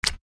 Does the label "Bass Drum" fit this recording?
No